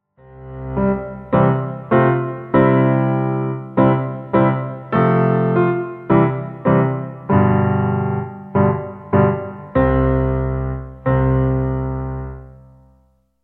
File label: intrada playalong